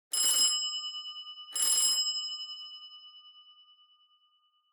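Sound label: Doorbell Mechanical Bell Multi; ドアベル 機械式 ベル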